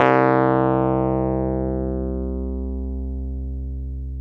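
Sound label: piano, Wurly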